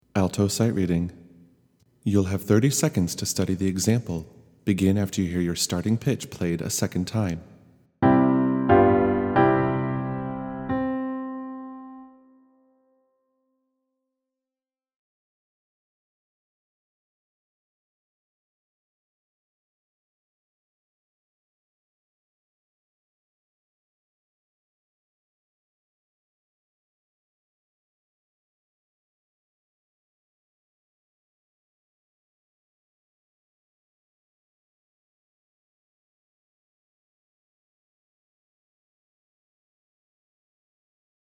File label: Alto; PWCS All-County Auditions